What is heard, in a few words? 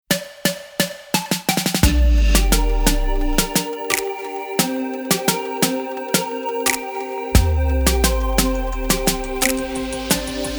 Jingle B Ramp; Europa Plus